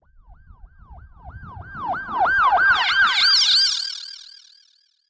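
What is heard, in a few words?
Filter Processed, alarm siren samples 125bpm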